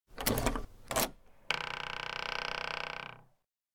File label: door postman room open careful; anemoi